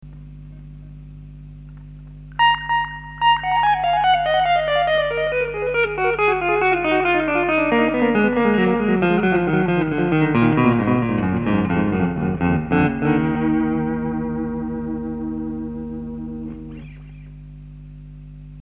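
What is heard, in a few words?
H2O Liquid Chorus & Echo, SLAPBACK ECHO; E．TIME0 REPEATS1 LEVEL10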